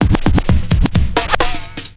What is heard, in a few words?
filter roll